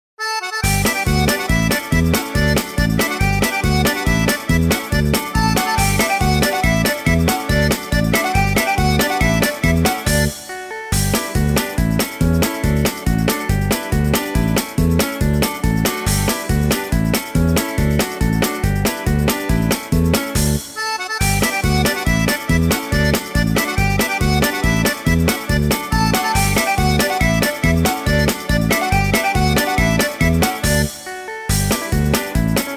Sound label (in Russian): kamarinskaya, rus nar